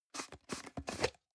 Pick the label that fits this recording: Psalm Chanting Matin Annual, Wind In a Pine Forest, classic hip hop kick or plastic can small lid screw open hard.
plastic can small lid screw open hard